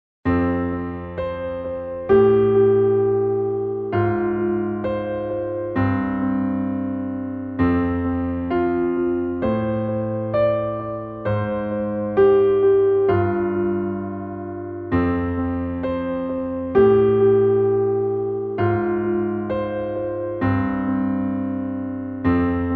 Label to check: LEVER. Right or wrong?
Wrong